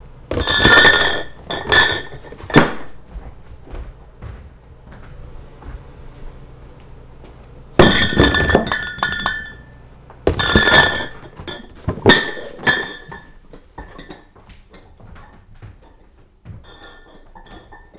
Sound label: Case Of Beer